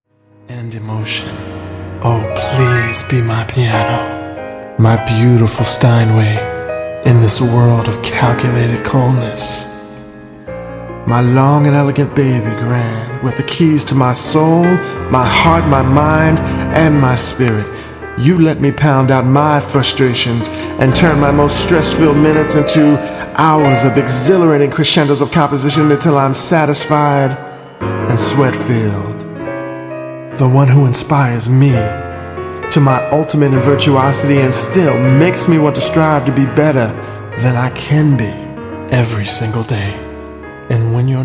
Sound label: Be My Piano